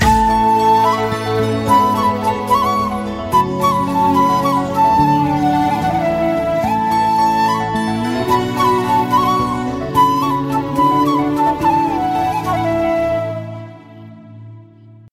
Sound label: Flute Rhythm